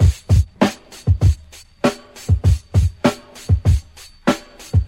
99 bpm breakbeat f key; Samplerate 44100 Hz

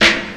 00s crisp hip hop snare drum sample f key

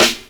airy snare one shot f key; snare drum samples

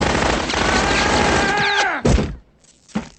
yelling, Echo; sound effect

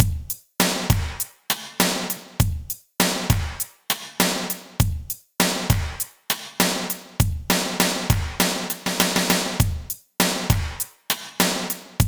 100 bpm drum groove d sharp key

